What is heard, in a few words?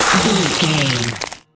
ice spell freeze frost